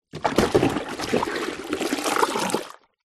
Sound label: household plastic bucket fill up with water from swimming pool